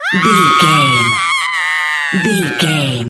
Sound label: voice female scream